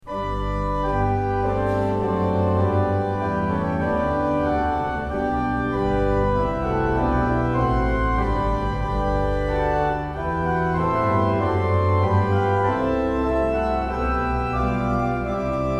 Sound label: hymn